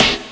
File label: snare fx; DnB; Breaks N Beats Sound Kit; DnB Kit 2